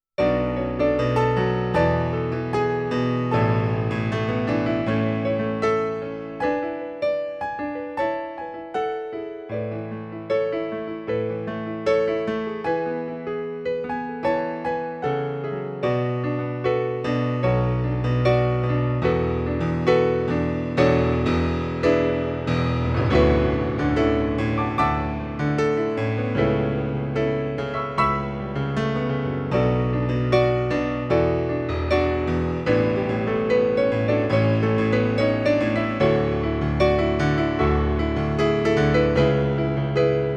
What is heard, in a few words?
accompaniment